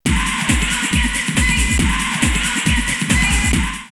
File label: Vocal 138, drumloops Techno House; Getting Vocal; X-Static Goldmine